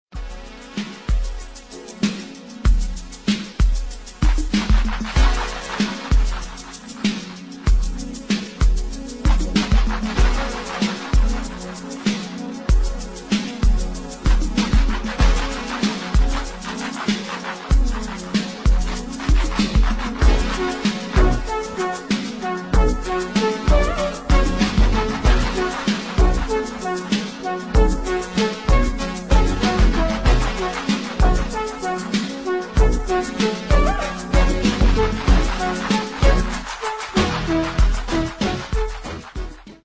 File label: funky little beat slowed down